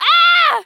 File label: Female Pain